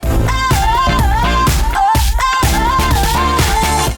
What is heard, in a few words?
whoop